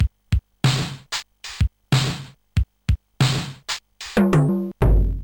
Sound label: drum solo tom; score; Musicraft